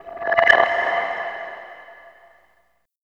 SKIMMER HI, PERC; NorthStar - The Wizard VOL-2; TSAR PERC

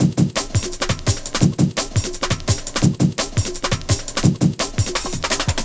Drum & Bass Beats & Breaks; Sonic Foundry (Sony Creative Software) - Fluid Dynamics Drum & Bass

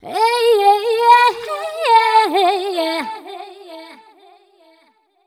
f 128 mel e, fem Vocals

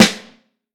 Vintage Snare Drum

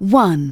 vox, Techno Trance; Ueberschall

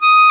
WND CLAR, Clarinet Sh; Roland LCDP04 Orchestral Winds; 90 s Sample CDs

